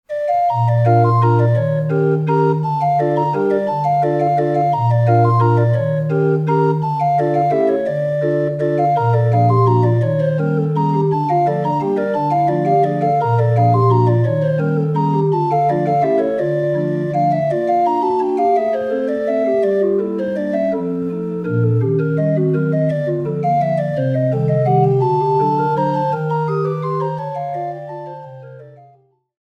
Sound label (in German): Melancholischer Laendler; P. Ragaz